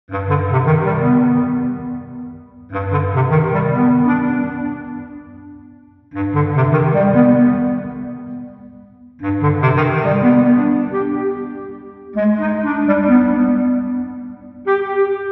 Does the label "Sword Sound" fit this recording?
No